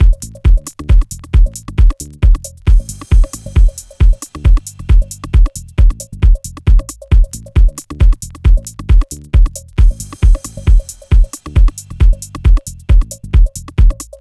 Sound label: Bass